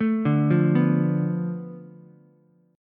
B Minor 7th Flat 5th B Ukulele; Bm7b5; arpeggiated; JGuitar

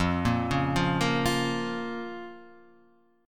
F Suspended 4th Sharp 5th; arpeggiated; x,x,3,3,1,0,0,2; JGuitar

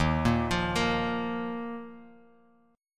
D sharp Suspended 2nd D sharp, Bass Standard; arpeggiated; chord; JGuitar

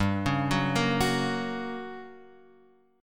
G Minor Major 7th Flat 5th, G, down

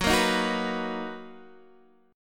B Major 7th F sharp, chord

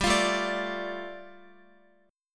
G 7th Suspended 2nd, Guitar; G7sus2 strummed

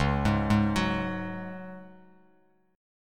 Db Suspended 4th, Bass Standard; arpeggiated

